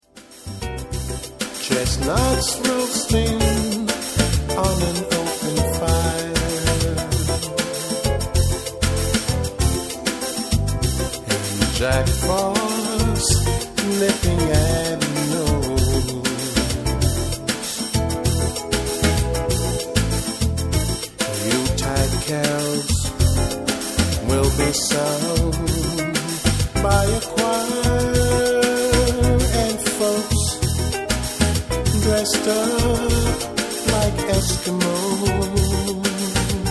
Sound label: with vocal, steel drum band